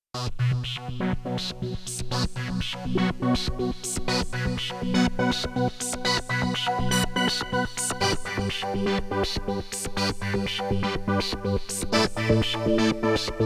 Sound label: Korg Radias-R